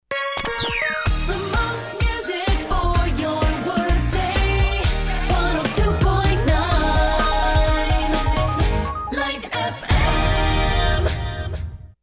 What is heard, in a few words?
FM Jingle; WLTE